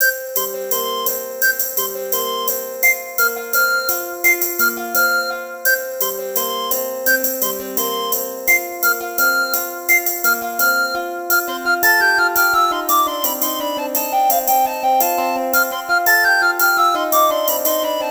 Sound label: flute dance